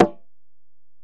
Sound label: Ac Perc, Drum Machines Alesis DM-PRO